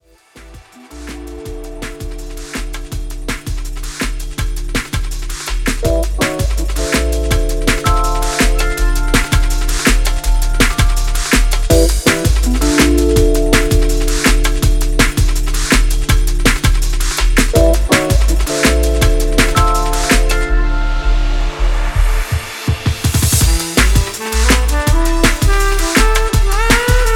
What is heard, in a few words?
DUBSTEP